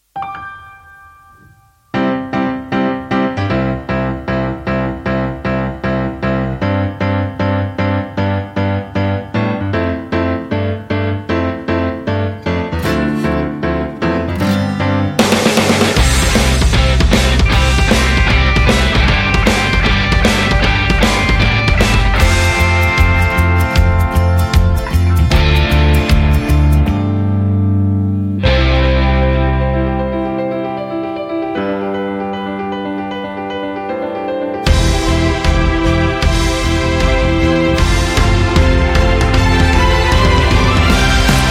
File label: Music only